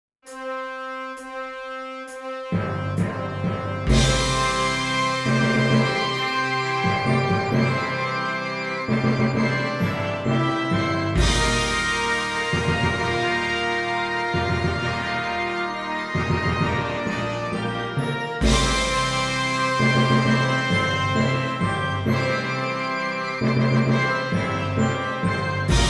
Horns in Fifths

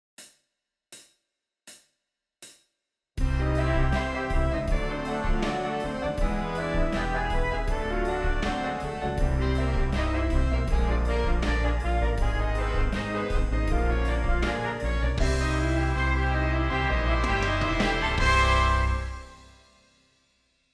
Whole Tone Scale Horns in Fifths

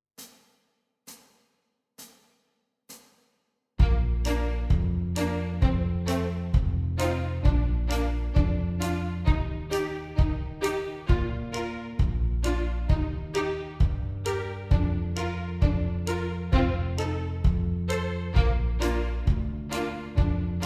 Revised Tempo 66bpm

